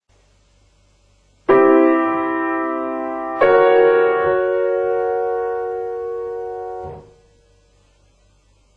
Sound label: pedal